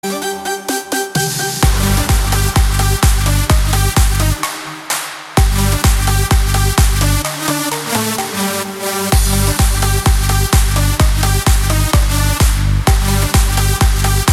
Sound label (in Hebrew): רינגטון